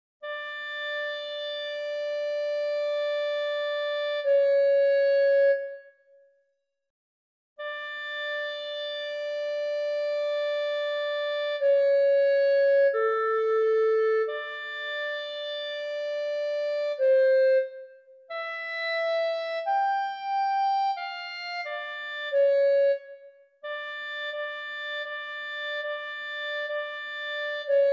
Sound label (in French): Clarinette; AGNUS St Jean